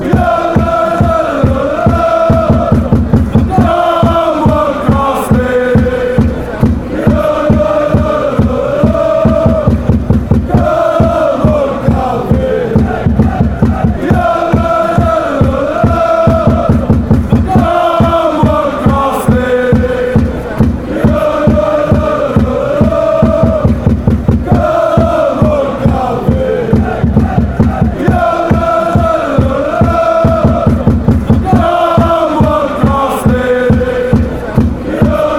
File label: mechelen chant